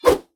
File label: fire, combat, weapons, swingable; default swingable